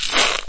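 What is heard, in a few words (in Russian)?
треск сухого дерева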